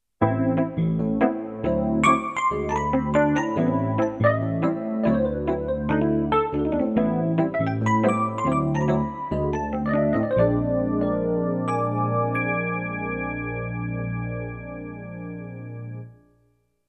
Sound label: yamaha ex